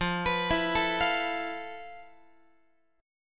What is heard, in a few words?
F Major Sharp 11th, Guitar; arpeggiated